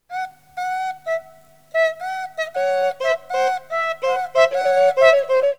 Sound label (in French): flute